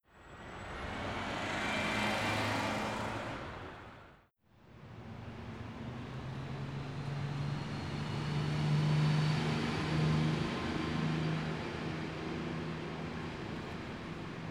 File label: Bus Bys; Vehicles; Cars & Trucks